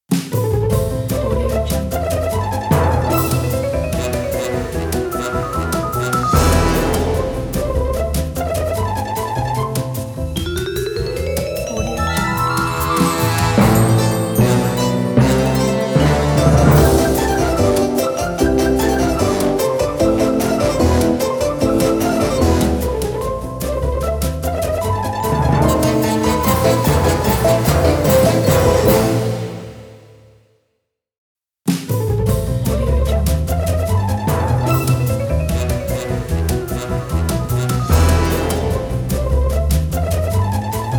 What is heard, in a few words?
turkish march funny; tmstockbox